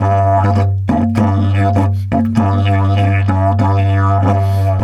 DIDGE 100BPM, Didgeridoo

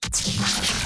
boooopbop

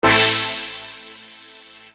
Minor Thin; Construction Kit; HIP-HOP; Sonic Foundry (Sony Creative Software)

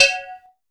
FX BELL, PERCUSSION; AKAI S6000 CD-ROM - Volume 5

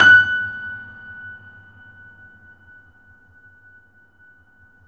F#, piano sustain

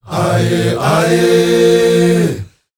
AHYEH, World Voices, S.AFRICAN; ILIO; Vocal Planet VOL-5

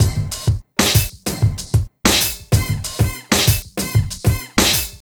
Street Tip, R&B Drums

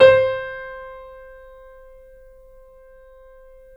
C, Piano; InVision Interactive - The Piano

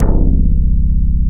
SMAK, synthesizer bass; VFX-SD SMAK

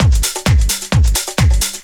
groove, DRUMLOOPS

